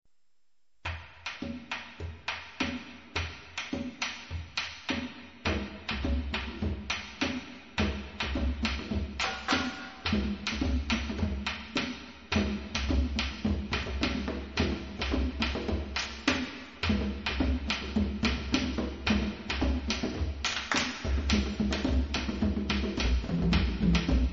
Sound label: Kpanlogo